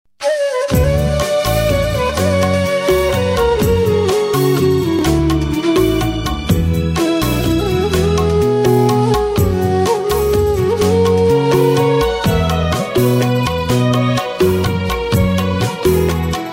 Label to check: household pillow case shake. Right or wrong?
Wrong